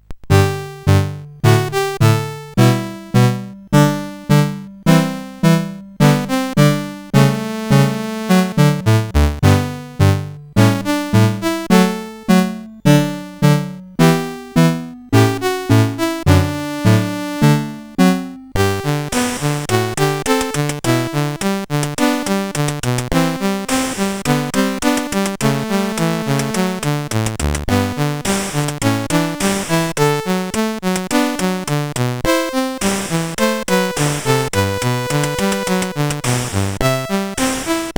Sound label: Fireworks Celebration, CSG8580R5; Complete Computer Fireworks Celebration Kit